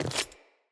wood step; Characters; Ambient; Sprout Farmers

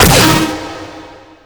Laser Barrage; Warframe; Raptor

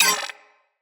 Cipher Enter, UI

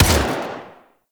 Burst Rifle Fire; Grineer; AI; Weapons

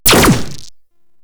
Drone Laser Fire; Warframe; Corpus; Super Moa Drone

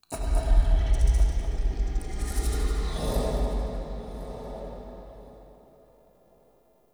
Interior Texture Large Moan; Ambience; Indoor; Warframe; Infested Eris

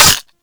Mech, Magnum; Warframe; Tenno; Magnus Prime

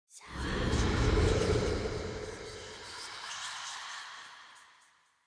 Start Whoosh; Echo Young Deora

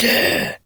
Injured Grunt

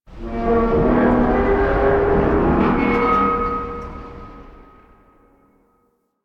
Ambient Tank Vocal; Nineteen Ninety Nine

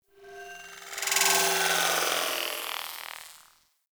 Metallic Textures; Ambience; Warframe; Corpus Railjack

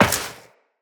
Step Metal Perforated, Footsteps, Walk; Tenno

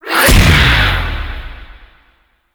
Whip Impact; Exalted Whip; Warframe; Warframes; Khora

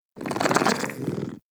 Kitten Growly Purr; Warframe; Catbrow

